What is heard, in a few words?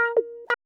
Guitar Comp; Pro Tools Essential Demos; Digidesign